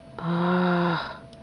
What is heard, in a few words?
augh; Nokama